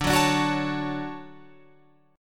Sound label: D Major 7th, Guitar, Open F; DM7 strummed